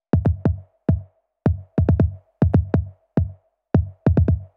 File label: 105 Loose Grooves, Percussion; Touch Loops; ElementsOne; AbstractRhythms